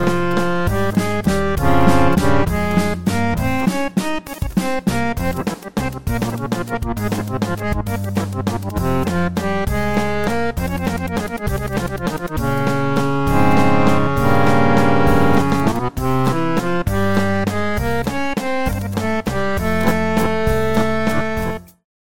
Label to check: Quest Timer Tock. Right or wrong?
Wrong